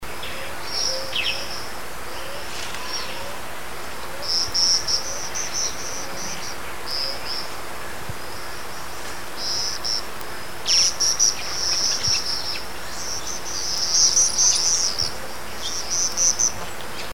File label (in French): martinet claudia